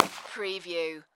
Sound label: Pistol S W P99 40 Cal Single Shot Exterior At 45ft; gun shots; Gun sound effects, bullets, gun shots, pistol sounds, rifles, machine gun sounds